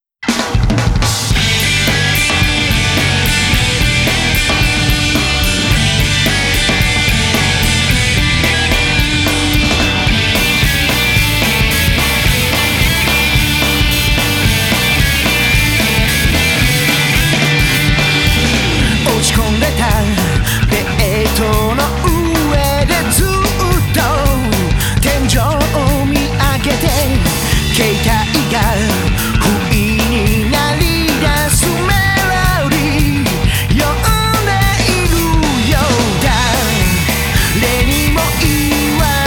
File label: Rock'n' Roll; Rock'n' Roll Travel